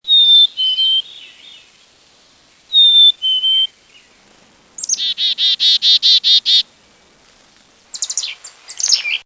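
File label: Chickadee Black-capped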